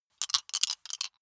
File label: big three rattle in hand closed short